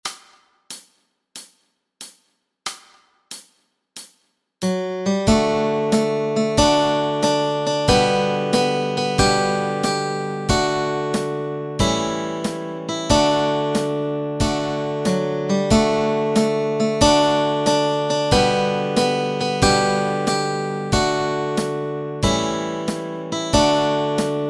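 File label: melody and chords, Easy Guitar and Ukulele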